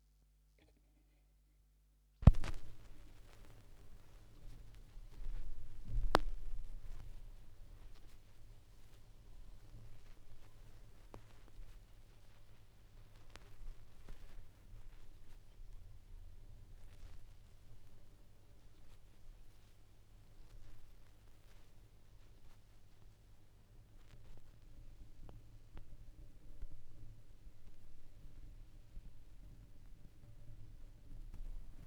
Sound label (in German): schallplattengeraeusche, Vinyl